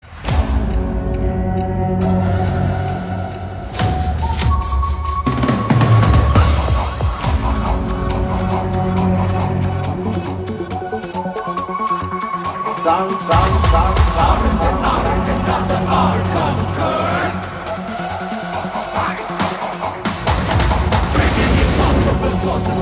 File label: Ringtone; RRR Making; Telugu